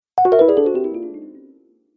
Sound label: Classic Fall Sound, video game sound effects